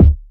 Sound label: smooth kickdrum sound d key